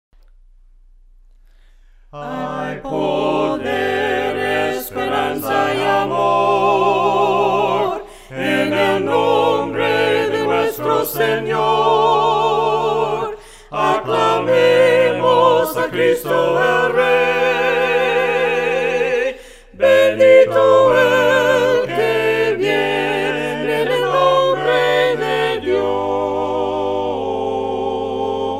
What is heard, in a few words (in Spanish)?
En el nombre de Dios Cantando sin instrumentos